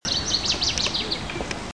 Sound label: northern waterthrush, Warblers; Birds